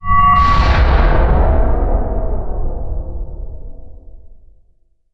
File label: shield respawn